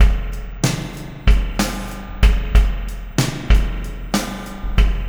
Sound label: Landing Party Beat, Processed Drumkits